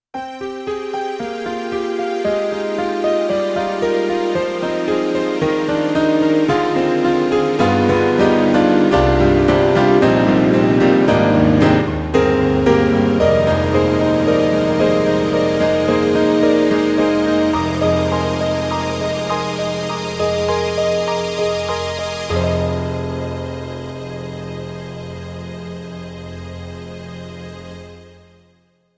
YAMAHA MU90 Piano Strings

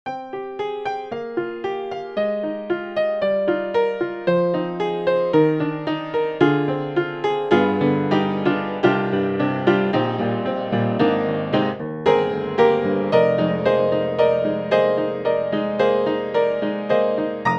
Upright Piano Upright Piano with Overtones; Native Instruments

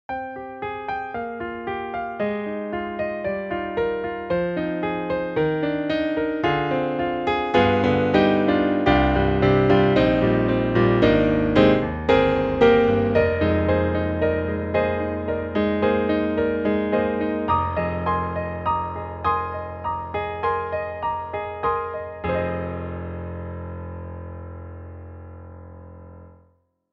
Grand Piano 2; Steinberg HALion 3

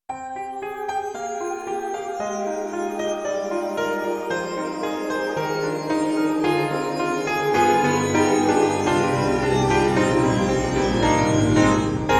Cicada Piano; Roland; SonicCell

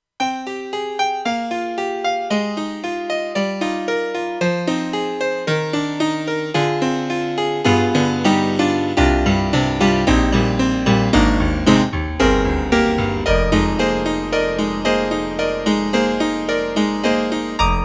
YAMAHA TG100 Brite Pn2; DiskOrchestra

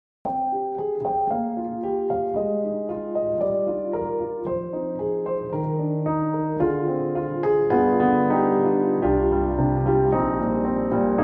Grand Rhapsody Piano, Warm and Emotional; Waves

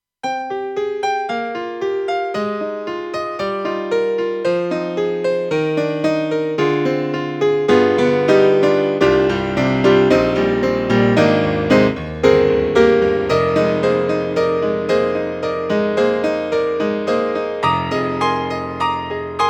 AKAI SG01p, RICH PIANO

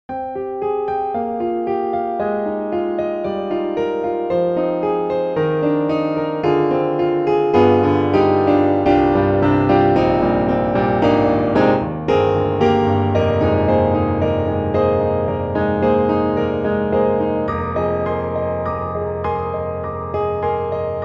Pianoteq Grand C2 mellow; MODARTT S.A.S.

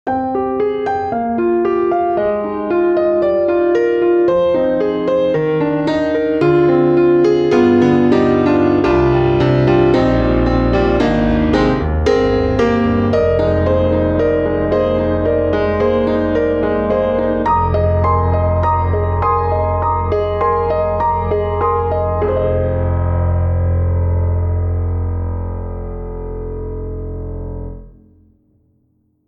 Modern Studio; Native Instruments

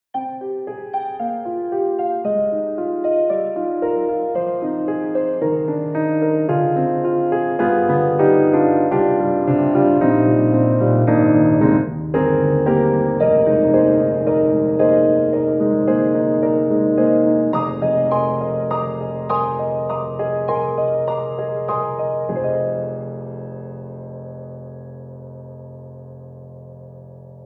Tubular Keys; Steinberg HALion 7; Verve

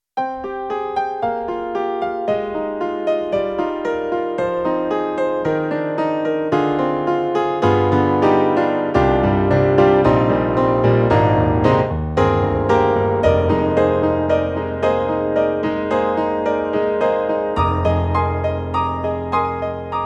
KORG SV-1 Piano Strings

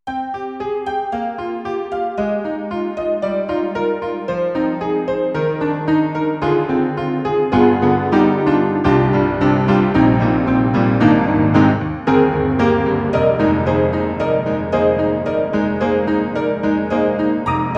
SD-90 Contemporary Old Honky; EDIROL; Old Honky w

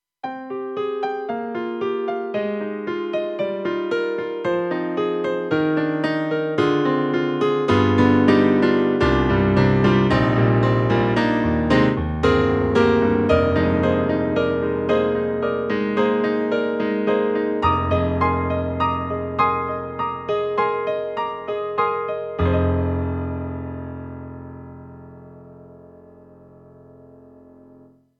Stereo Grand 3-Way; M3 Version 1 Wave; KORG M3 XPanded

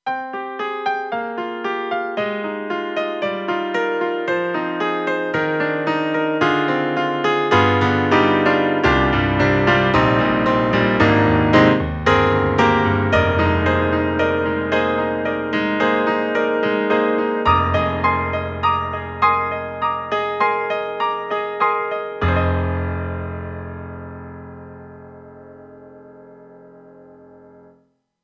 Grand PF mono On Stage; KORG M3 XPanded Program

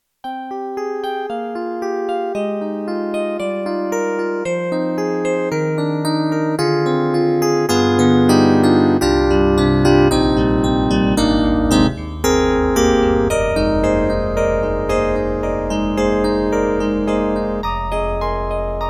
YAMAHA MU1000EX, Bright DX

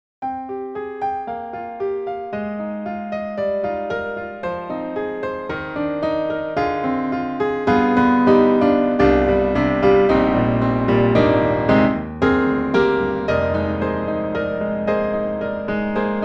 Grand Pianos Bosendorfer 290 Grand; Synthogy; IvoryII

